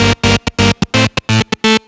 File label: Special Sounds Root G# 128 BPM; Vengeance Samples; VEH3 Special Sounds